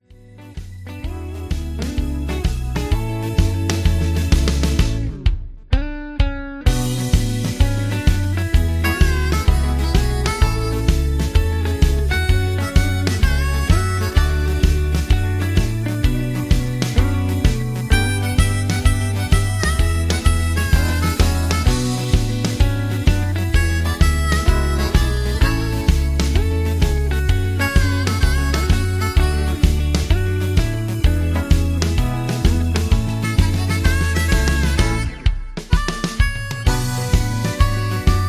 Instrumental

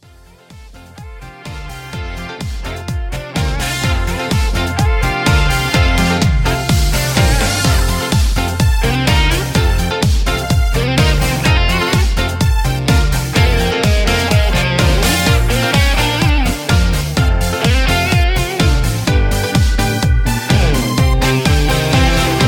Instrumental

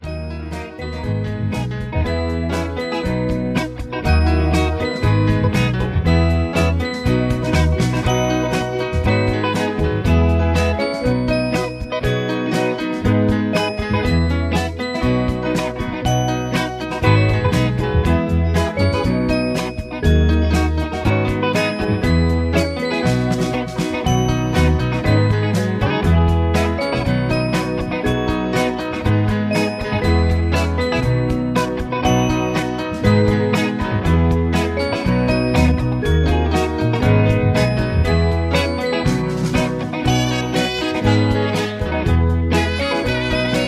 Instrumental Lower Key

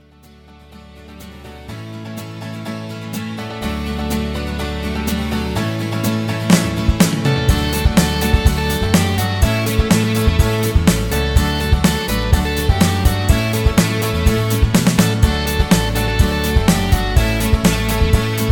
Instrumental With BGVs

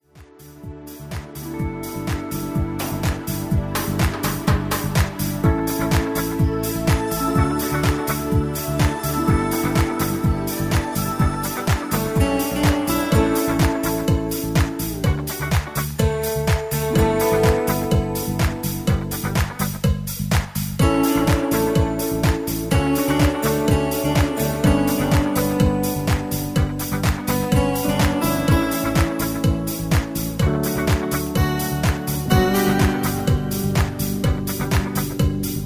Samba Guitar Patter

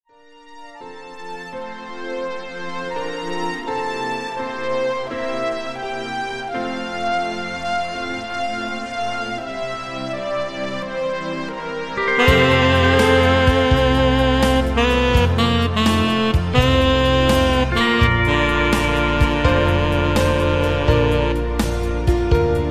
Rumba Instrumental

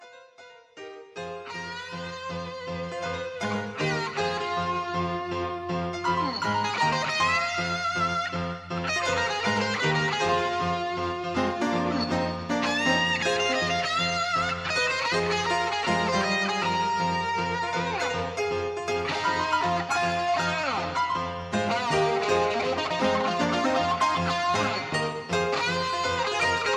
High Key

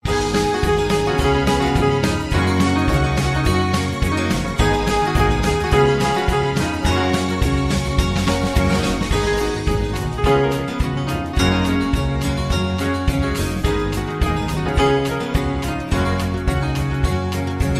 Low Key

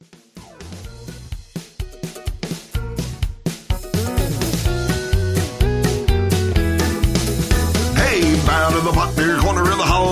Patter; Called C1

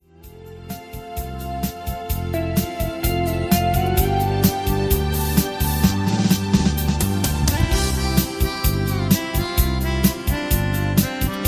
Mississippi Instrumental; Singing Calls

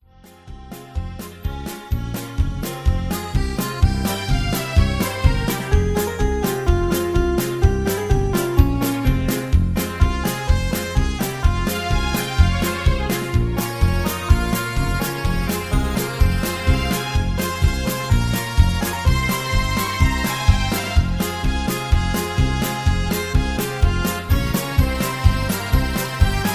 Patter

